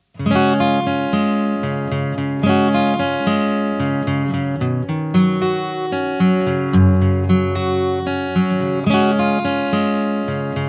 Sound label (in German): Westerngitarre; Britstrip